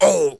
worker die; propack

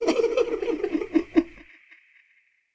tower attack; propack

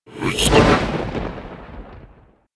archmage attack; propack

